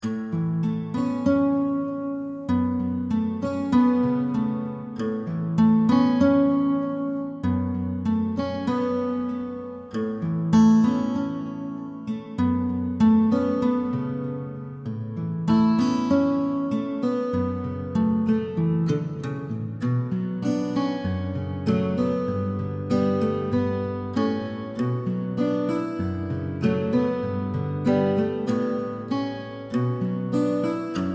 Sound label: Silent Rain